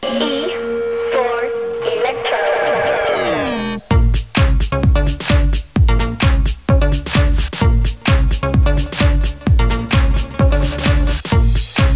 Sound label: musical chairs; cstrike; jb rush